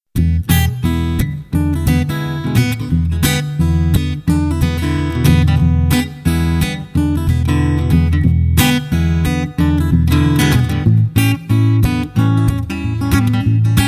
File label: Blues